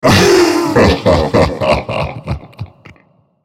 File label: laugh; nasusskin05; lol; character voices - english